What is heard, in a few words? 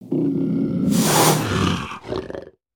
Exotic Lizard Speak; creatures pets